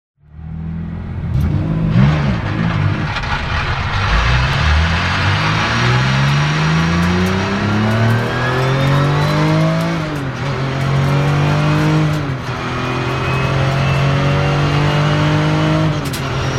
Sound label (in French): 996 a bord, Porsche; le son des moteurs; Démarrage / départ